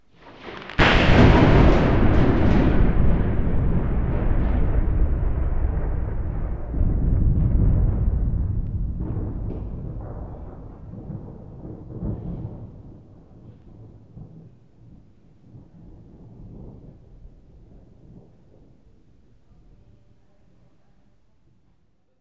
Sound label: lightning and thunderclap; sound effects and sound reinforcement; The Passion of Dracula